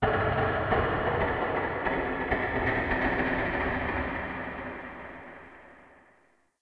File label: Wooden Clicks; Warframe; Shadowgrapher